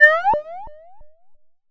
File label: Cry